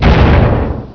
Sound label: thud